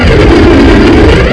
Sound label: projectile; svencoop; scshogo